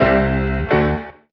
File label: blaps, Stabs